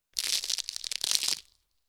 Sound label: crack